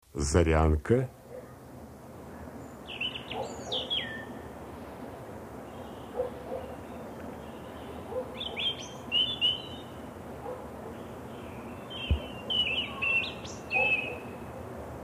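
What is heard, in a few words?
zaryanka golos, European robin voice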